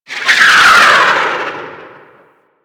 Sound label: Plunge Ability Angry Proj By; Enemies; Abilities; Warframe; Duviri; Duviri Dax; Dax Knight